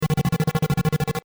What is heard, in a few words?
pipipi